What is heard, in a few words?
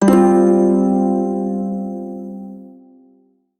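Shawzin Pentatonic Minor Chord; Warframe; Prime Shawzin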